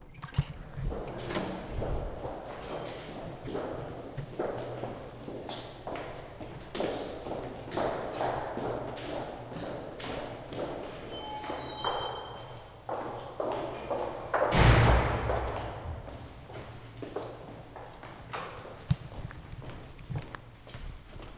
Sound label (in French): sortie travail - Amb ville Angers; ZoomH2N